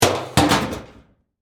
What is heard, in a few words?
Trash Can Lid Close, Household, Trash, Can, Lid, Close, Metal, Garbage, Can; BlastWave